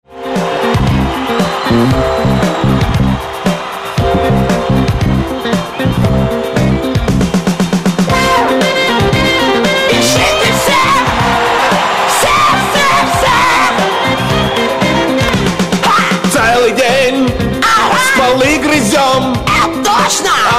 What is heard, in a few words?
beavers song; angry beavers